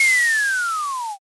brake

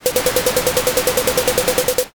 printer

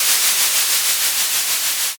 balloon inflate

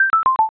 score down

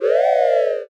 ooh

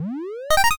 cabinet boot

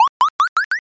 bounce

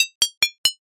chain

